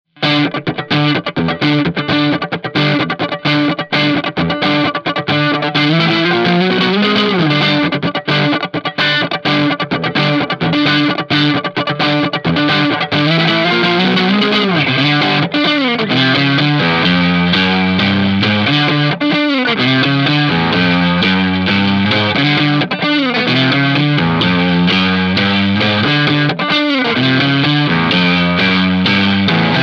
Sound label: funk; ampvergleich